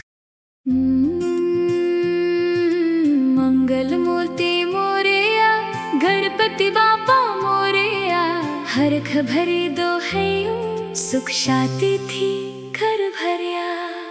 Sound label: gujarati mangal murti morya, ringtone; ganesh